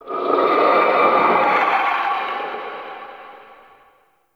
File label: Under City Antique Rattle Distant